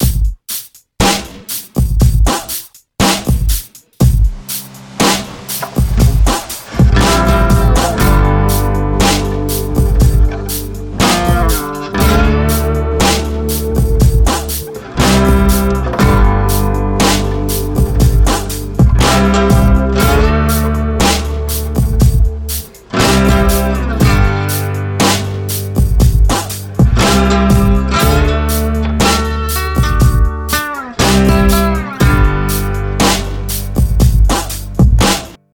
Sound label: slide harp